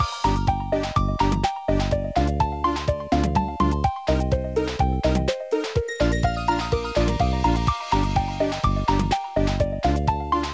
BGM Normal; Ancient Scrolls

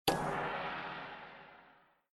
SFX SPIN BTN; slots; Lucky Wok

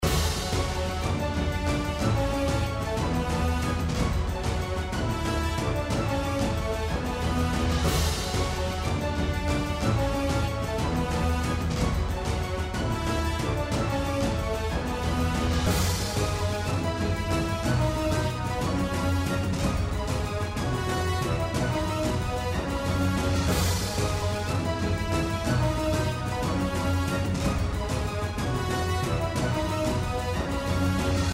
Bonus BGM; slots; Pirate Quest

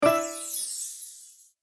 Win Symbol; slots; Chic Town

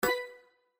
spin stop; slots; Fortune of Olympus

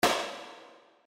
Bottle Open; slots; Valentine Blast

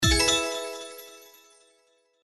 All Win Animation; slots; Outdoor America

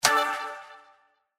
spin stop win; SLOTS; REEL; Cyber Angel

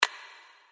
BTN Close; slots; Halloween Blast

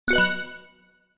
Reveal Win; slots; Wealth Gods2

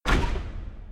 Stop, slots; Majhong Royale

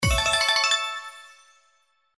SFX Symbol Scatter Win; slots; Panda Majesty2

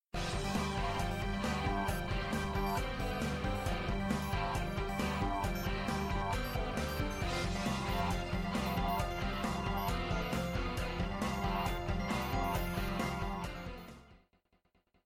Normal BGM; slots; Robot Vs Monster

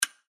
BTN NEXT; SLOTS; EFX; Genies Wild

